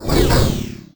Scifi Synth Tone Switch Off; Energy & Forcefields

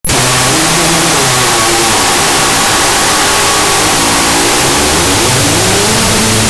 Door Metal Squeak; 1001 Sound Effects; Foley

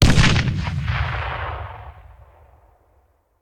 Grenade Hand; Sound Ideas; Guns

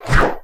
Pickup Misc Swish; Pickups

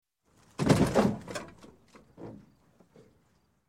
Crash, Auto Small Side Swipe; Sound Ideas 2000 Series

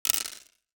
DICE on Hard Wood, Throw and Roll, Mini, Six Dice; Samples; ArticulatedSounds; DICE Sfx Party (Extended)

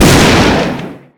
Shotgun Shot, Weapons

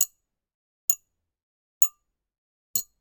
Generic Click Metal Tiny

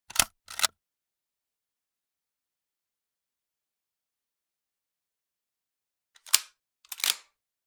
20 Gauge Shotgun Work Action; Hollywood Edge; Heavily Armed

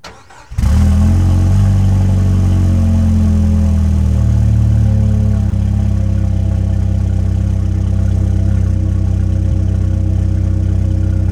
Jeep Wrangler Start Idle Loop; SFX Kit; Trucks

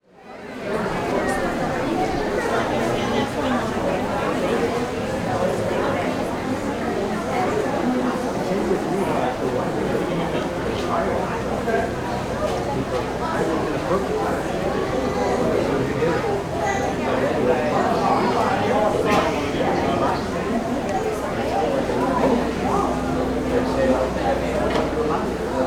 Waiting Room Clinic or Hospital, Walla; Sound Ideas; Walla Series

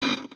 radio out; Blind Drive

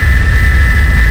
Rocket Missile Loop; SFX Kit; Weapons

